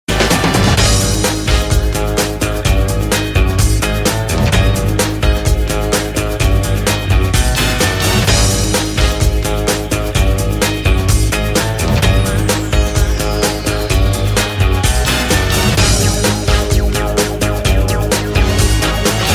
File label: Rocking Guitar